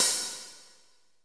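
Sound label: hi hat, drums; Best Service; Real Mega Drums VOL-1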